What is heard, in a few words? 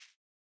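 Footstep Grass; boomerang fu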